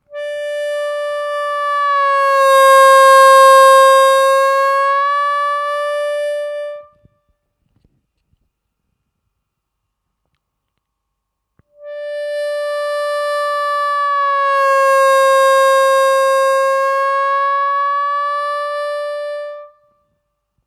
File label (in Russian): Звуковой файл для отработки бенда (в паузах играйте свой бенд на четвёртом отверстии)